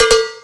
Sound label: Sfx Winter games